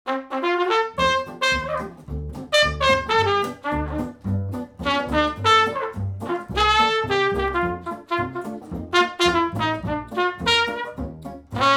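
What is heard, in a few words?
Dixieland with trumpet, Jazz Trio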